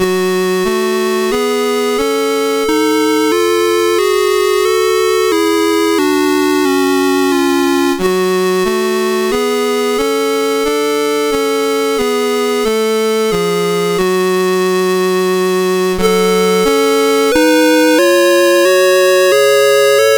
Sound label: Basque Lullabye, MOS6581; MOS6581R4